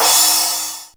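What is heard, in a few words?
DRUMTRAKS crash, Drums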